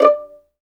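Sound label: STR 2 PIZZ D5, Violins Pizz; Vls Pizz; Roland L-CD702; Vlns Pizz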